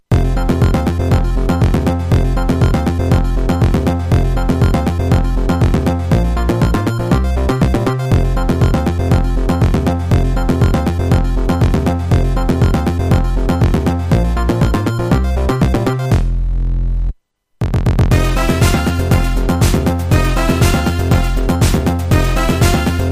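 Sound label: korg ds 10 fm mega driving sega genesis kit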